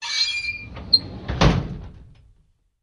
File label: Drawer Metal Close; drawers